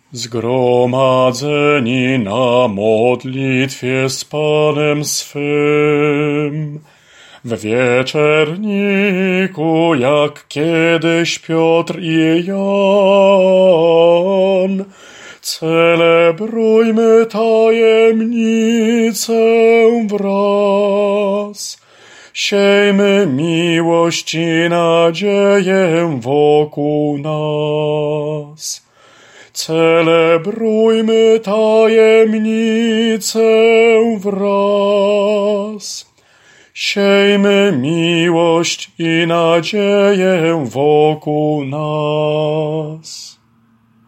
Zgromadzeni ALT

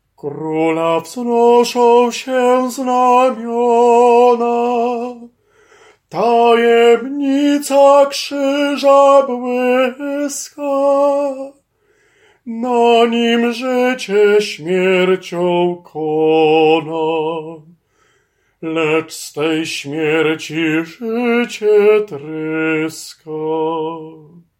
Krola wznosza sie znamiona TENOR

